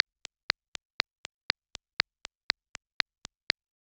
click digital suave y lim

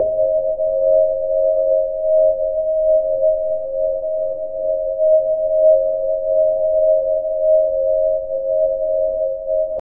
el sonido ambiente, museum

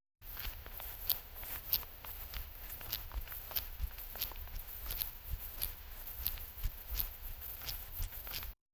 una mosca caminando sobre, insect

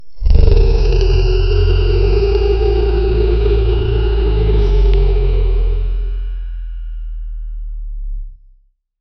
el rugido de un monstruo, kaiju

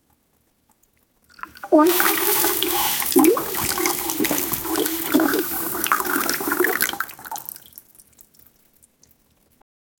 orina femenina cayendo al, bathroom

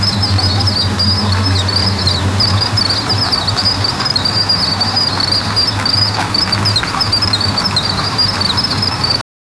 mil hormigas marchando

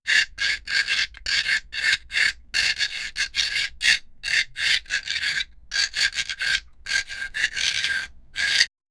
grito de susto de animatr; animatronic

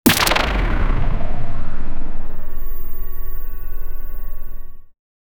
sonido de dao al jugador

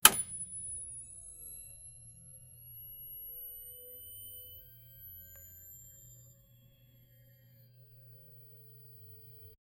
sonido de una tv antigua, flicker

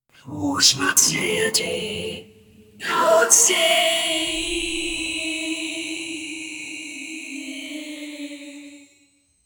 coro de brujas diciendo

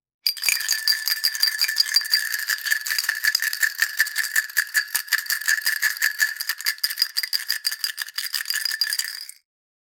llenando un pote de crist

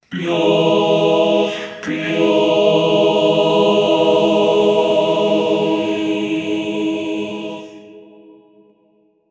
un coro angelical recitan, choir

